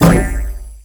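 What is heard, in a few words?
Launch Shroom Projectile Bounce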